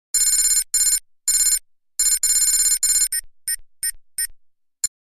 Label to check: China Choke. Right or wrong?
Wrong